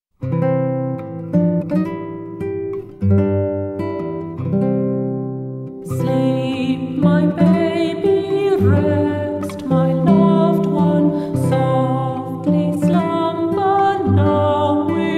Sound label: SUO GAN IN ENGLISH